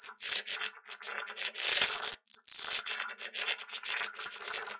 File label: Cracklature